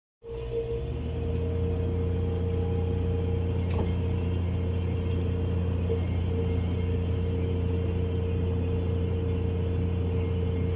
Refrigerator Compressor Motor Turn on Steady & Off, Household